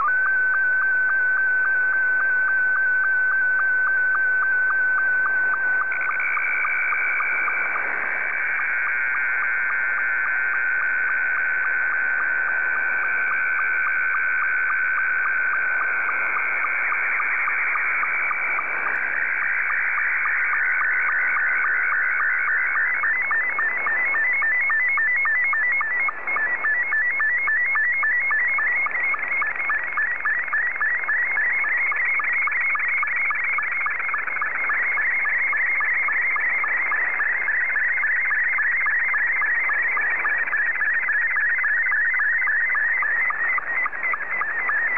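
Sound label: Scottie 1, slow-scan television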